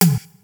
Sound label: DR-660 FX; Rubber Chicken Software Sample Bank